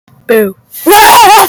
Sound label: boo aaaaaaaaaaaaaa